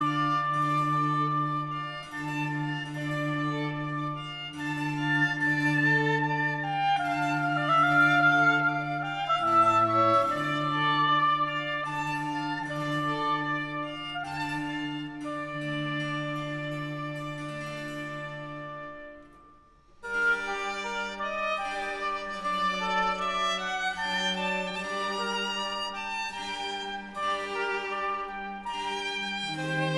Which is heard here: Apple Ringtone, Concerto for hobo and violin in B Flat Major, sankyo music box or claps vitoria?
Concerto for hobo and violin in B Flat Major